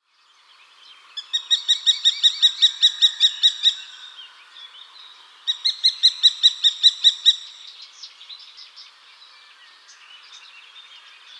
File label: Falco tinnunculus